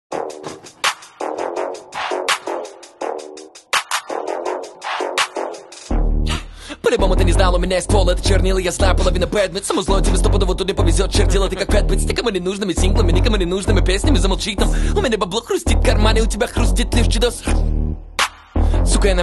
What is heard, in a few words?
russian cover hip hop